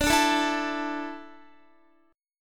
D Suspended 2nd D, down, Guitar; strummed; 4F,4A,5C,5G,5C,6E

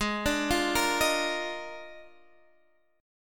Eb Major 7th Suspended 2nd Suspended 4th Ab

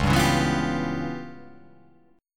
C sharp 7th Sharp 9th Flat 5th, chord

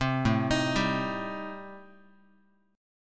A Minor 7th Flat 5th A, Guitar; Am7b5 arpeggiated; 3,5,6,5,x,x; 4A,4E,5A,5D,5G#,6C#

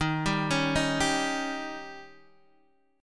C sharp Suspended 2nd Flat 5th D sharp, chord

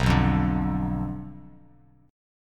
B Diminished B down Bass Standard; Bdim strummed

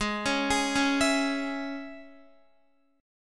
Ab Suspended 4th Sharp 5th Guitar, chord; Absus4#5 arpeggiated; x,11,11,13,13,11; JGuitar

